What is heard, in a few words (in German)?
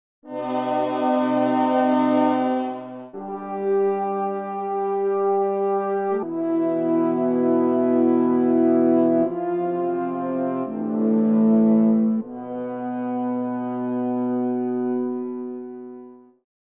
melancholische Horn Signal